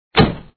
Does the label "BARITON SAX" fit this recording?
No